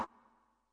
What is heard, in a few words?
scrub, Yamaha Tenori-On; Scrubkit